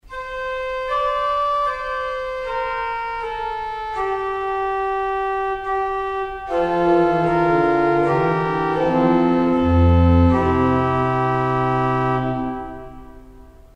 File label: Geigenprincipal 8; urkutieto